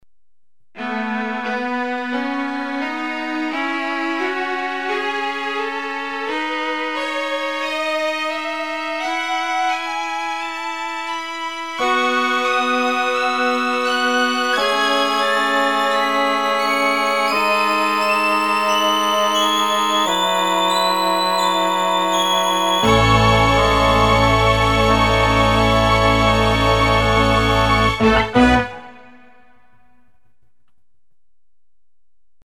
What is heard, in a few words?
string pad